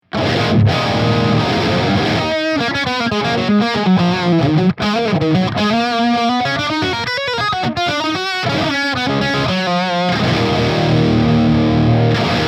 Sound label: cali rectifire, helix amp model; Helixシリーズのマルチエフェクター